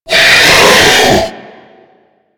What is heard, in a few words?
Attack Scream; Warframe; Stalker